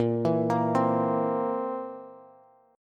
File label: A sharp Suspended 2nd Flat 5th A sharp, Bass